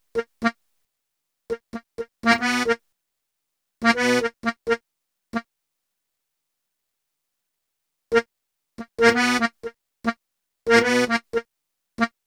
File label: Accordion; Sonic Foundry; Cinematic Funk Urban Tone Poems; Master Zorn